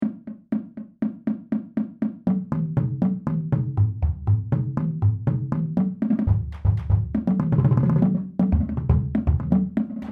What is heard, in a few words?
Bass Cadence 6 Bass; NCSU